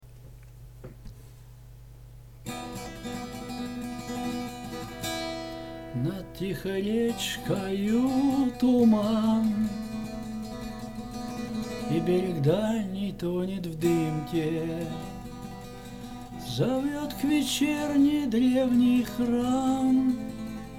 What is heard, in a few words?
guitar